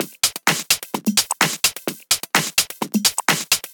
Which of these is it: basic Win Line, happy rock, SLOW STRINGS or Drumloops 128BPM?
Drumloops 128BPM